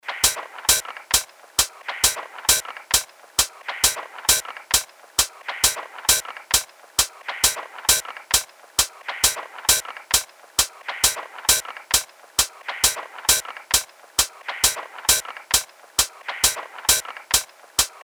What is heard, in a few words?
Groove